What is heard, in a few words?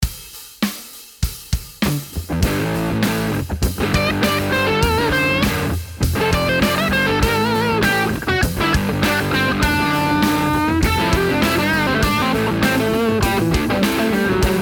Crunch